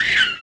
Bleeder Hurt Vox; Sid Meier's Civilization 4 Complete; Beyond the Sword; Units

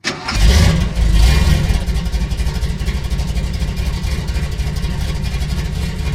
van start loop; vehicles; v8; Left 4 Dead 2

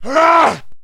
man grunt; Mount&Blade Warband

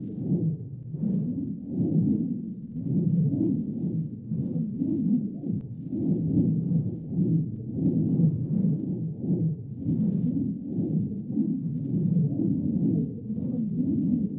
Asteroids Amb Bed Lp; Ambient; Sid Meier's Civilization 4 Complete; Beyond the Sword

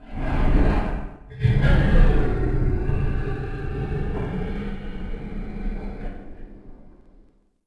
Motor Sucky; Ambient; Sid Meier's Civilization 4 Complete; Beyond the Sword

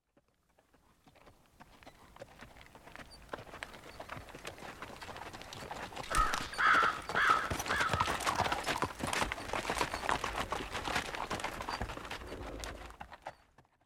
Horses Crows; Age of Empires III

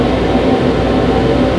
manhole steam loop; ambient, spacial loops; Left 4 Dead 2

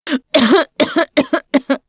Cough, russian

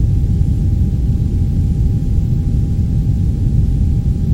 Room Tone; Duke Nukem Forever

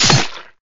sword hit; Mount&Blade Warband

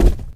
cardboard, footsteps, run; Left 4 Dead 2

